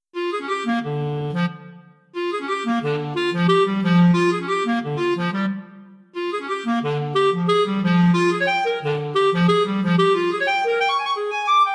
Blue Miniature for Solo Clarinet

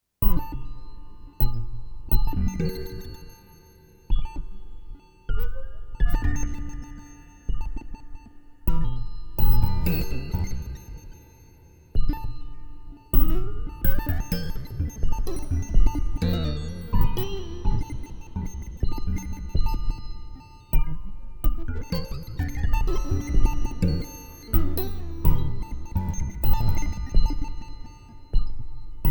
gamelan kalimba warp

